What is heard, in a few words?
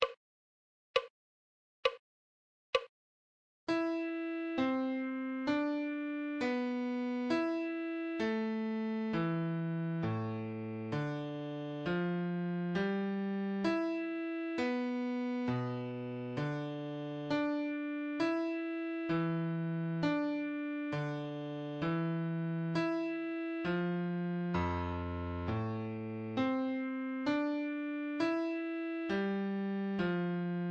musical reading in bass clef Adagio